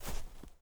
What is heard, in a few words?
Footstep Snow; Among Us